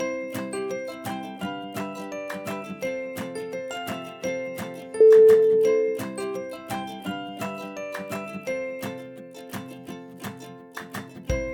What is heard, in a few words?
ukulele beats